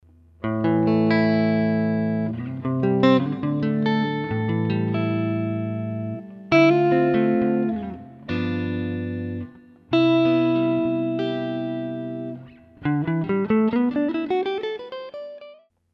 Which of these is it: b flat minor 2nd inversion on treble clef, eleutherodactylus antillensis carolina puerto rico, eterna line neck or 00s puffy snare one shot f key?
eterna line neck